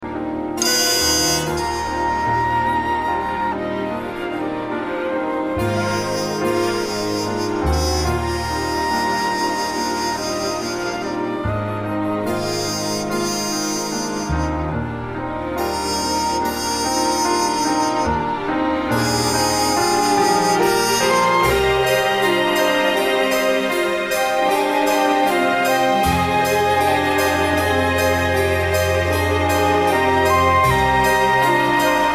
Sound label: accompaniment only; audition